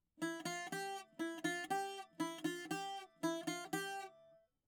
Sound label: bouzouki